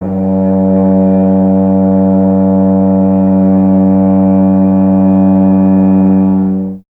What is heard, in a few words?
BRS TUBA, Solo Instruments